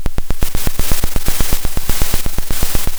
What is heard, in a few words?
pop, Sound of a drum roll